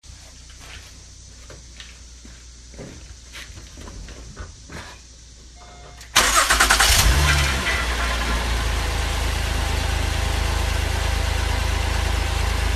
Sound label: strange engine noise startup engine noise; 4g tl